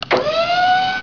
Moto

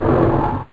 rogue open; Kaleidoscope Schemes; Midnight Amethyst

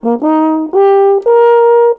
horn toot; Musical Instruments

